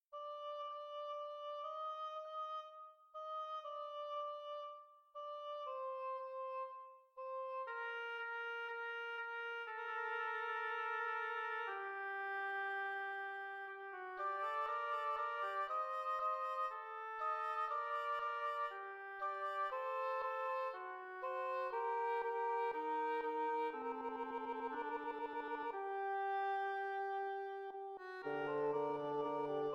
3 ob; abbe stadler

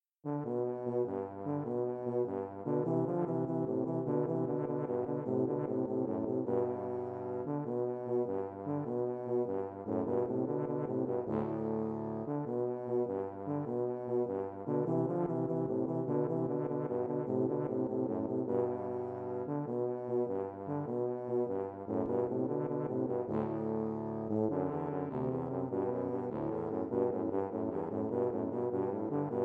tuba duet; der kuckuck

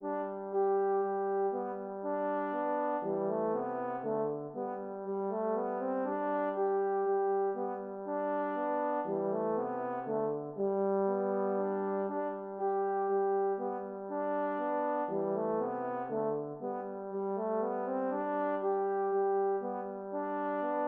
minuet 2 horns; telemann

